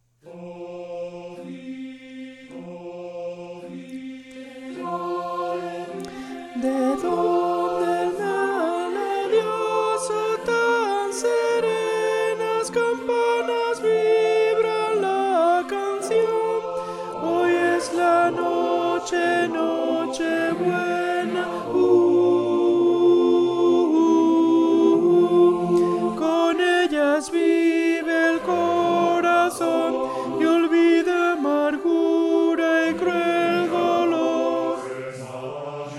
Altos

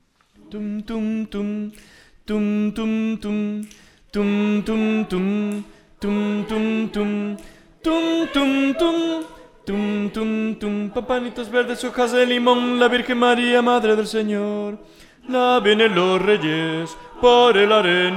ya viene la vieja tenor; navidad

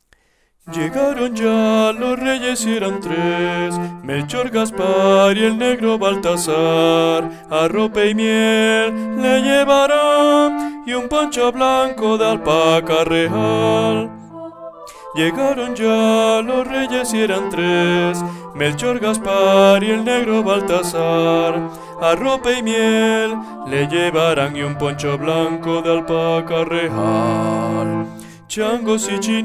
Los reyes magos bajos; navidad

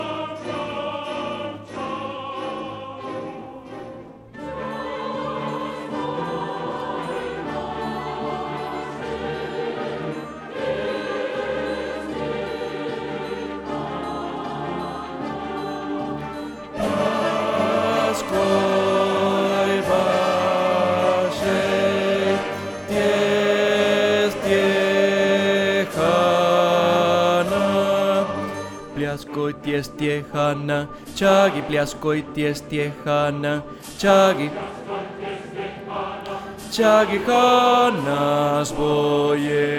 Danza BAJOS; REPERTORIO ÓPERA; Danza Igor

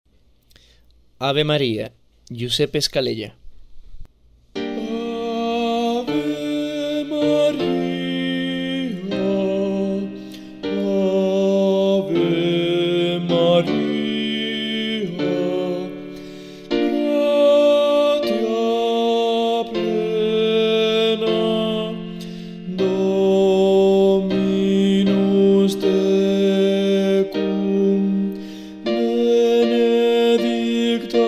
Ave Maria Tenores, Musica SACRA; Scalella

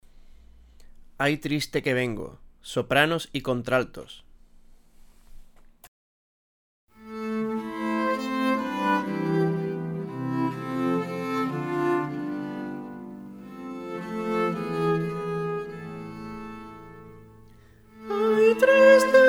Ay triste que vengo sopranos y altos

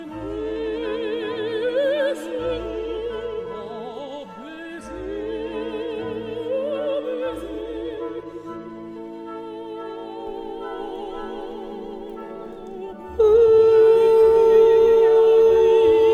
Barcarola sop; REPERTORIO ÓPERA

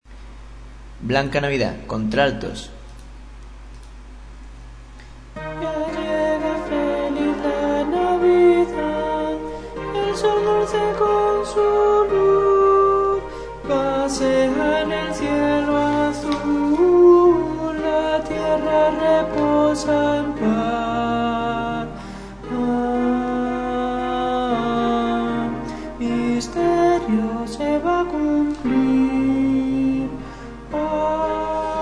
altos

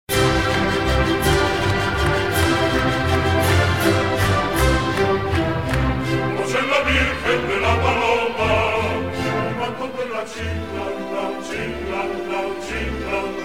Seguidillas bajo

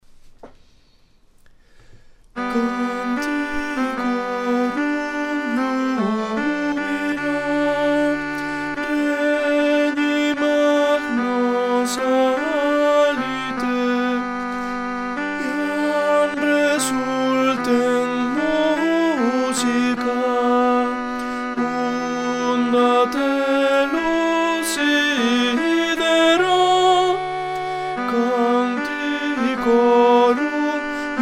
Canticorum Tenores, Musica SACRA

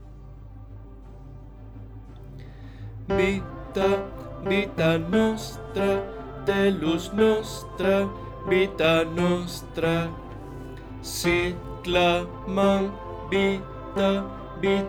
TENORES A y B; bandas sonoras

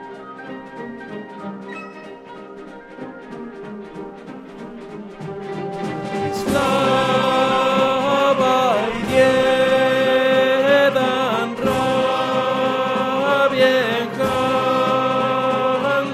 TENORES; REPERTORIO ÓPERA; Danza Igor

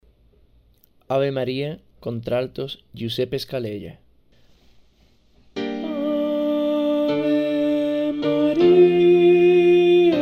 Contraltos; musica sacra; Ave Maria Scalella

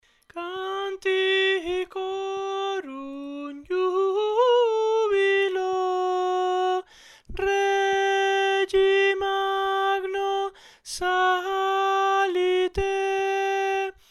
contraltos; musica sacra; canticorum

